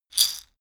shaker wood hit single hard

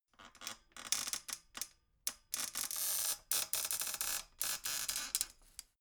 folding chair squeak creak

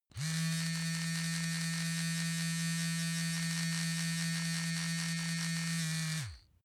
razor smooth strained

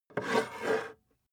metal scrape on wood

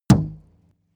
plastic canister hit hard

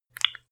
landline click

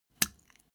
step barefoot wet hard short

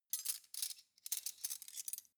cutlery teaspoons handle

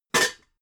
pots stack hard

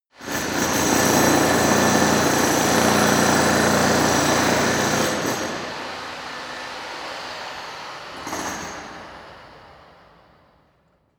construction site stone drill single long

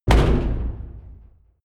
large damper

